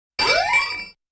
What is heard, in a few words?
Coin Credit; Namco Classic Collection Vol.2; arcade